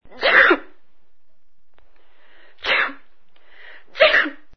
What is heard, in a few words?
ACHOO